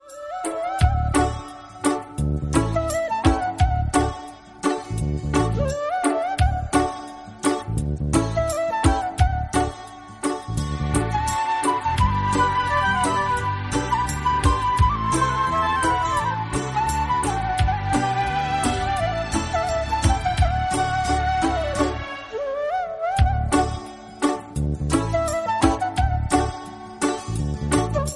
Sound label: flute cover instrumental